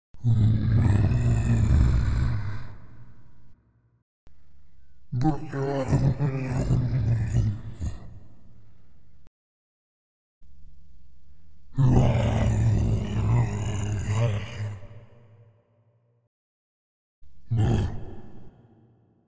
Giant Sounds

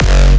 Heart Quake

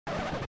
Vinyl Scratch

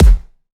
80s Kick

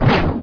Laser Wrath

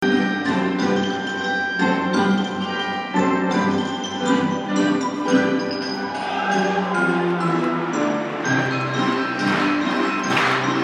Melody In A Concert Of Classical Music

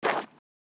Left Gravel Footstep

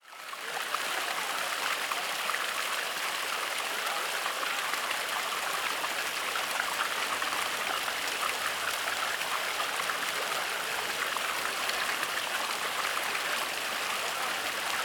Fountain Fontaine

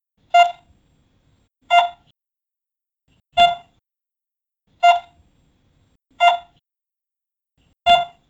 Heart Monitor

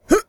Male Jump

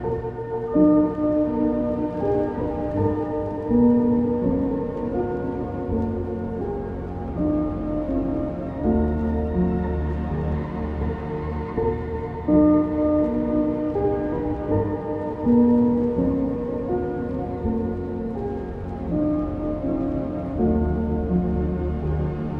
Dreamy Piano

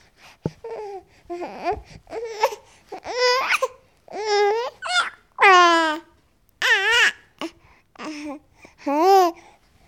Baby Is Happy

